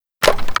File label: reload lift, foley; Call of Duty Modern Warfare